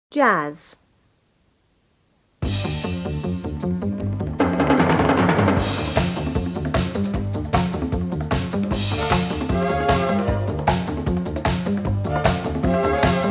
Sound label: Jazz; jazz intermediate foundation